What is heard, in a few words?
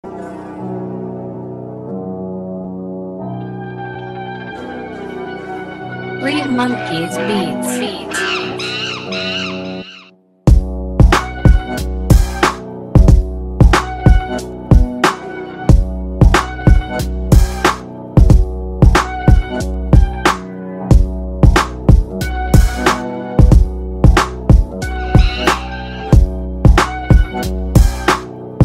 Beats; hip-hop; beatmaking